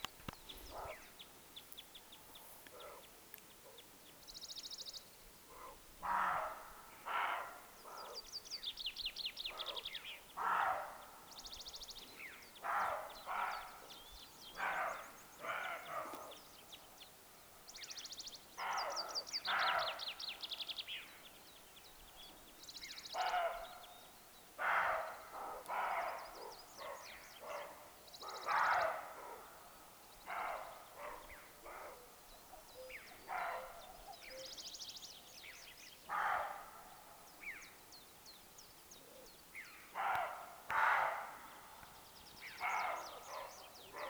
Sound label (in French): chevreuil; col du noyer